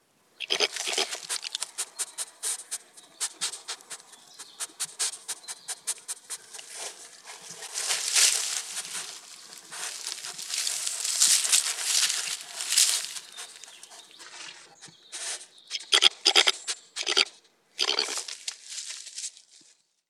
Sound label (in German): europaeischer dachs meles meles, tierstimmen; wildtiere